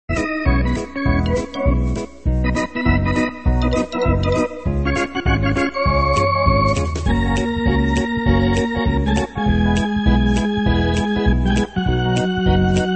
(Organ-Quickstep version); Street Surfer

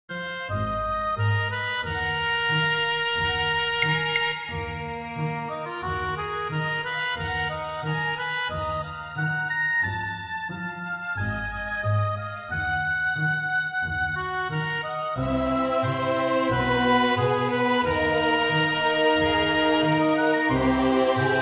Orchestral; Nemesis the Warlock

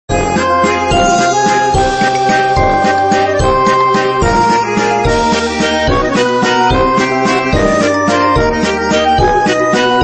Waltz

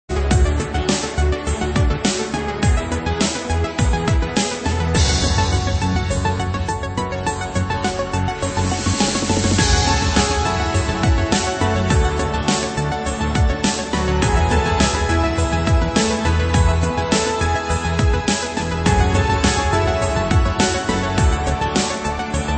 orchestral fusion

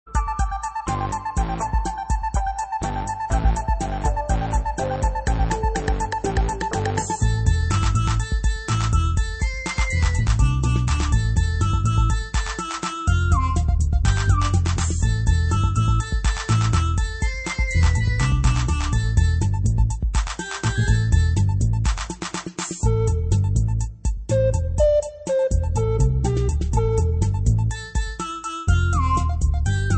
Flute Mix; Usagi

